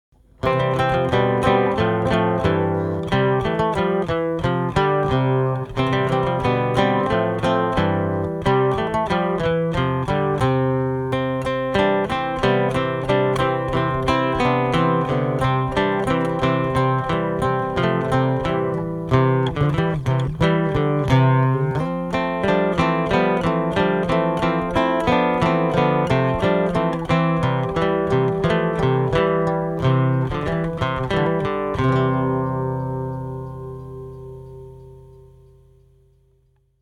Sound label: acoustic Tanzlied